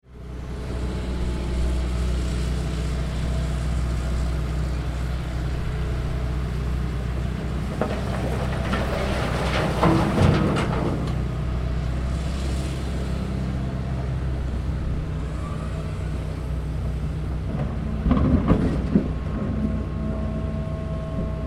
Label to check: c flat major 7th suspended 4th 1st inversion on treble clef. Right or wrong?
Wrong